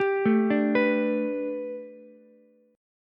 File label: A 9th Suspended 4th Ukulele C6, chord; A9sus4; arpeggiated; JGuitar